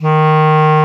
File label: KLARINETT E, Alpine Volksmusik